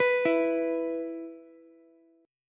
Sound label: E 5th, Ukulele; arpeggiated; JGuitar